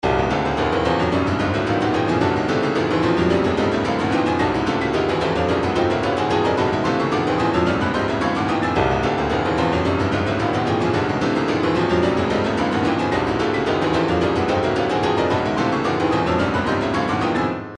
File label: retro funk 110, midi; grit